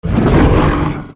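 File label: ATTACK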